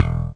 BASS FNK, Kawai R50; drum machines

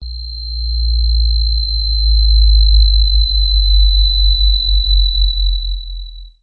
intense descent; Various Strange Sounds and Effects

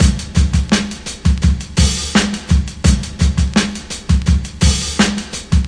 classic break, drum loops and breaks; VIBRIS CLASSIC BREAKS

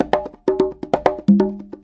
090 bongos, 090 bpm; Bazillions of Bongos

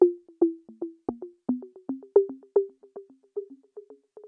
echo bops; SYNTH AND ELECTRONIC LOOPS

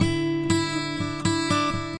acoustic guitar picking

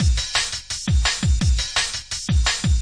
170 steppin straight, drum loop; 161 to 180 bpm

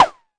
Arturia MiniBrute sn

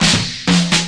reggae break, DRUM LOOPS and BREAKS

